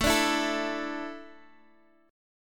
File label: A Suspended 4th A, chord